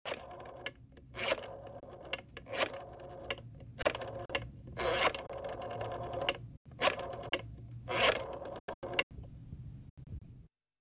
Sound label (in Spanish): marcar telefono antiguo